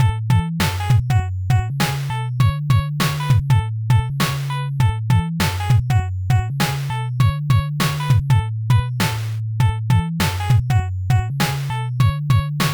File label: Swing